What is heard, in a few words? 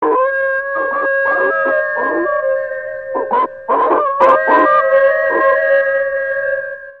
Wolf Howling